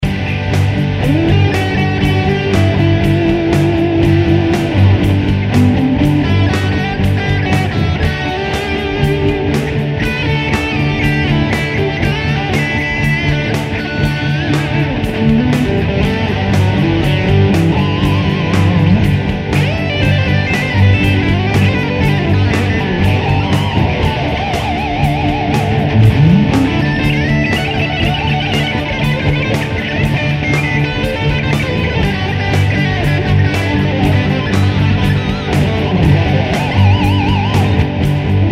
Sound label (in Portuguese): dois acordes